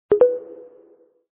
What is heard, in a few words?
user joined